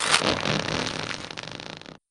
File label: food hold; gregson